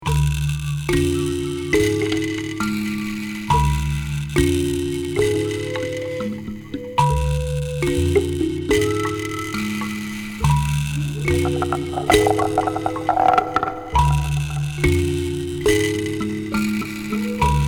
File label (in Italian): strument concerto